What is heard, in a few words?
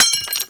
glass window pane piece break; Explosions